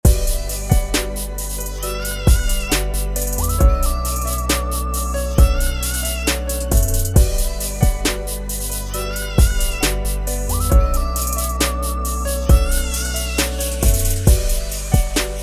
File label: akai mpc x ballad